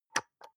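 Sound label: soap dispenser press almost empty soft, bathroom; haus-garten; badezimmer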